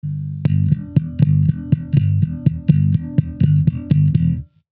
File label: ghost note